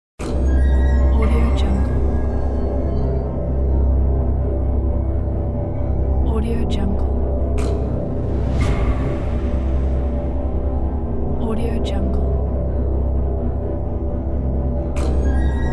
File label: cinematic background atmosphere